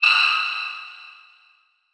Transition Hit Impact Short

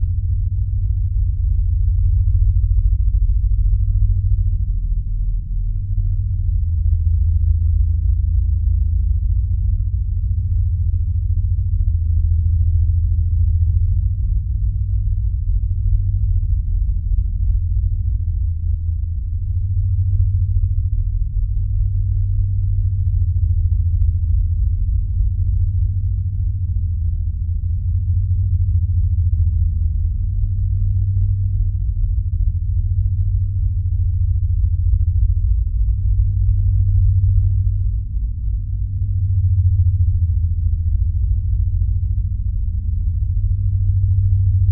Drone Pounding Deep Low Tremor; Production Element Imaging; AdobeCC

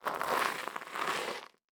Foley Tire Skid On Gravel

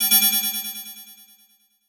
Title Transition Delay Short

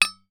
Impact Glass Clink Beer Bottle And Test Tube; Impacts

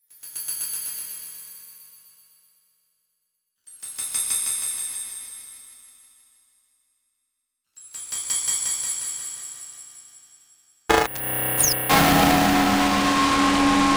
Electronic Whoosh Electrical; Module Production Element Title Imaging

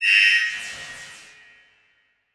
Transition Laser Horn; Production Element

